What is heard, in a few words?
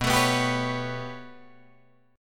B 7th Suspended 2nd Sharp 5th down Guitar Drop D, chord